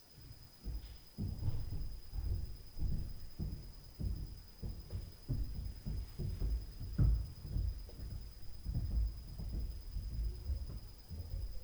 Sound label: FLOOR + STAIRS NOISE, Night Noise